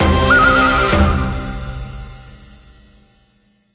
wild collect; Wild Ape

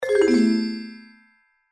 lose; Dreamcatcher

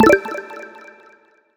gambler win; Sizzling 777; wazdan

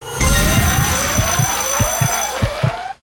mega win; Book Of Queen

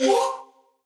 win; Fenix Play 27 Deluxe; wazdan

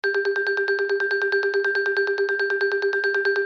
collect; Party Night

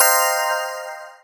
win; Corrida Romance; wazdan

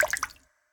gambler mouse click; Hungry Shark; Wazdan

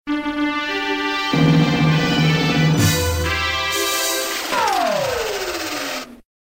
WIN MAX; games; Dynasty

